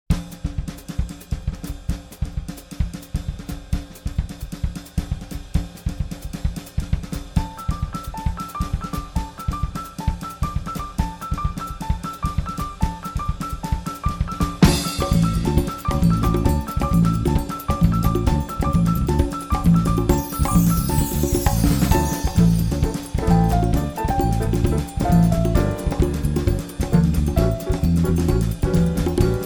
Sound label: samba